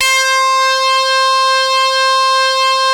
700 B-CH C 1, Korg; Back In Time Records; Korg Universe VOL-1

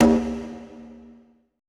BONGO L; BPC DRUMS

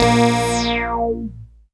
ACID BASS; ACID LN

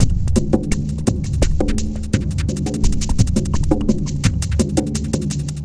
GLOBAL TRIBE GROOVE; Sonic Foundry (Sony Creative Software); Vir Unis Infusion

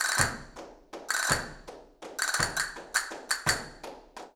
Castanets, Flamenco; Best Service ProSamples vol.46; Percussion

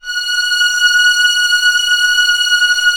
STR C6 FF L, STRNGS, Orchestra; Sound On Sound - Peter Siedleczek's Orchestra

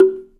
GATO; InVision Interactive; Percussion Slam

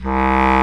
B CLARINET C1; E-MU Formula 4000 Series Vol. 5; Protozoa; Proteus2 Instrmt

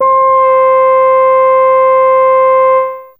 Alto C4, Fairlight CMI IIe Reeds

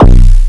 BD, Drums Dance & Groove; USB Soundscan vol.11; AKAI

